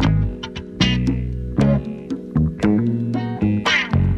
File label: bass, Loops Phonky